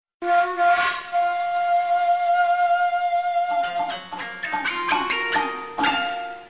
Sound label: japanese cute